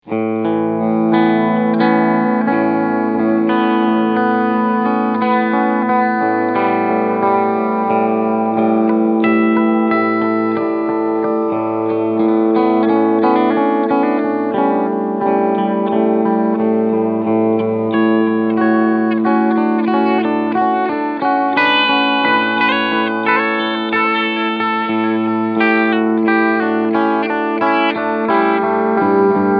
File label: Maximum Delay; XVive Echoman